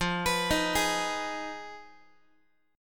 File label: F Minor 7th Flat 5th, Guitar; arpeggiated; JGuitar